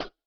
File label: Foot Step